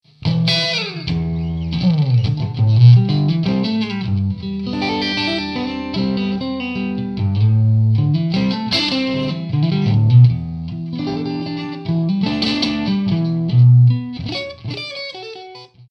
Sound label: ruby 6l6gcm-str used crunch